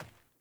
Sandals Run Dirt, Footsteps; Characters; Player

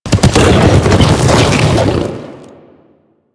Charge Ability Foley A; Warframe; The New War; Archon; Amar; Abilities

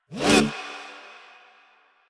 Bullet Jump Pre Land, Motorcycle; Motorcycle Ninety Nine

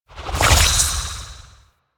Fluid Sac Tumor Trail Swallow B; Warframe; Infested Eris; Encounters

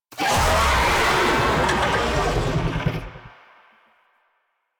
Infested Oni Vocal Attack; Warframe

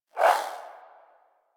Swing, Melee; Warframe; Weapons; Modular Melee

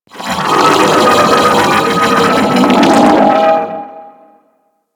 Vocal Idle; Amalgam Override; Warframe

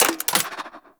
Spear Mech, Weapons; Warframe; Tenno; Priest Spear

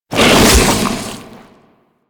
Infested Bone Blade Scatter Shot Fire B; Warframe

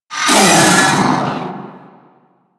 Vocal Pain; Warframe; The New War; Archon; Nira

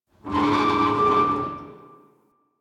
Destruction Resonant Metal; Ambience; Warframe; Corpus Railjack

